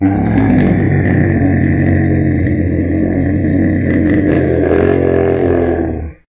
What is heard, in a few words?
run; I Am Weapon Revival; Runer